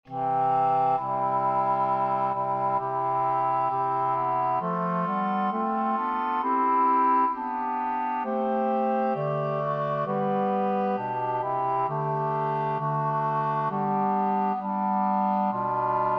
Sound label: tutti; Eternal Father